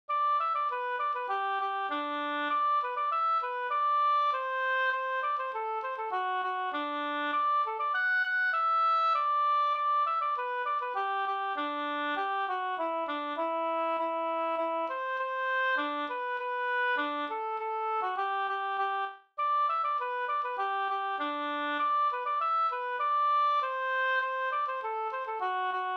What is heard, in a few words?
polka polkett pariserpolka